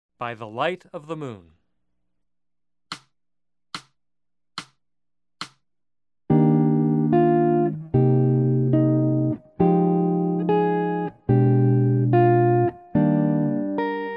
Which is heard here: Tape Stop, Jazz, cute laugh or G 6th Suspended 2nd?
Jazz